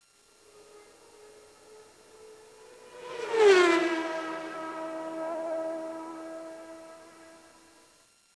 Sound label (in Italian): formul, motori